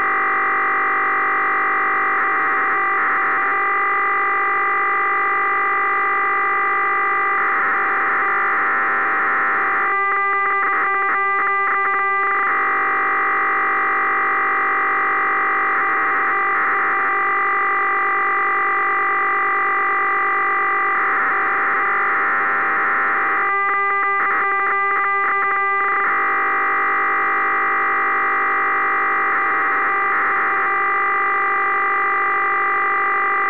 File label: ao40 p3d tlm